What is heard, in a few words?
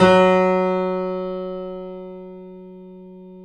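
piano, F#, left; InVision Interactive; The Piano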